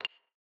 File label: tab Selection; IceCubes; Mastodon